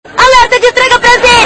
alerta

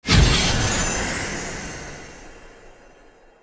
som de magica cura

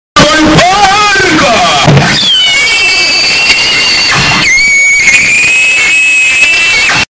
porco; trigugu

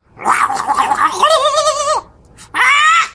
rindo loucamente, crazy laugh; oruden gremlin

